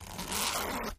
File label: zipper; zippers